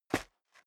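step dress boots concrete grit single stair up, footsteps; schritte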